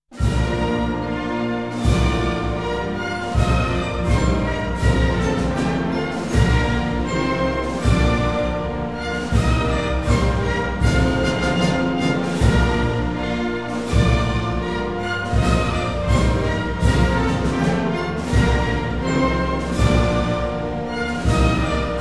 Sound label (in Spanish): Himno Nacional Orquesta